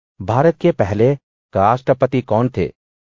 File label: techno